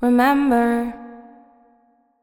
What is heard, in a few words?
Remember, Female Vocals, Wet; Advent Calendar 2017 Day 1